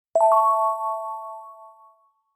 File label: roulette spin stop